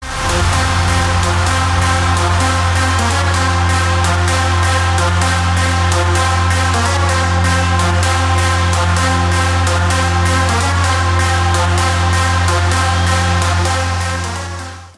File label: Rock